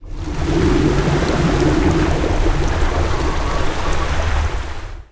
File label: whirlpool ground; smite; poseidon